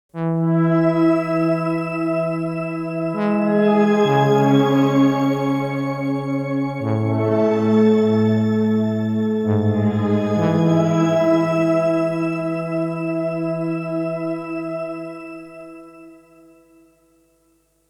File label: Boss RV-200 Synthesizer Shimmer